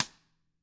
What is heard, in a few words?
CAMCO SNR; ILIO; Double Platinum Drums 1